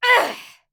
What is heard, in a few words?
Grunt Physical; Realm Royale; Hunter